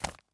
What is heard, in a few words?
paper grab